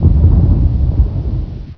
distant mortar

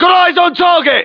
alert; fgrunt

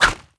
jump attack whoosh

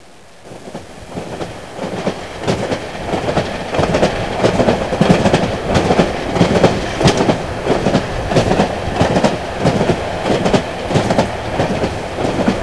train

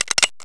gun reload

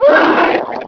slime hurt; ghouls forest2; slimejumper

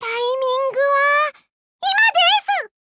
reloading

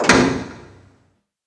clank

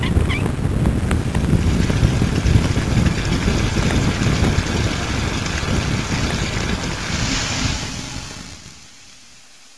fire off

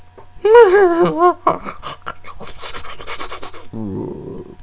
death

